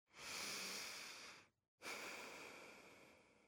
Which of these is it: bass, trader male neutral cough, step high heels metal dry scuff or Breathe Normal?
Breathe Normal